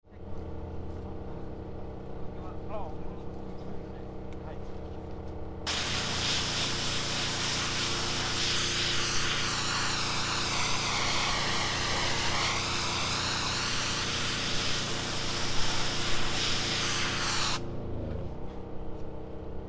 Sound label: decontamination of trees